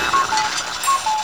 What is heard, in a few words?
clock cuckoo